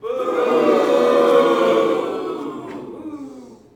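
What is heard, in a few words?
boxing crowd small boo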